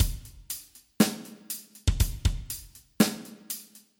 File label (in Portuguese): RITMO